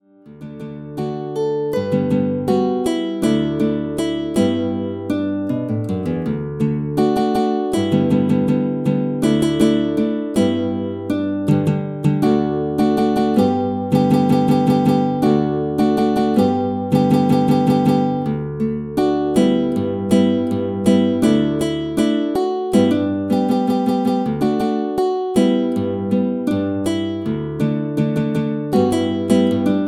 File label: synth